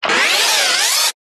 science fiction robot servo motor whirr operate movement